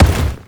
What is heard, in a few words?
land, foley